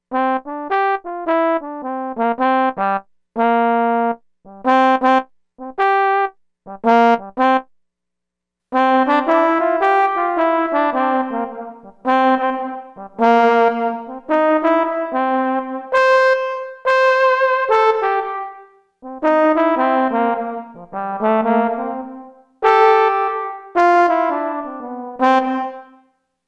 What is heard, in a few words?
OUTDOOR THEATER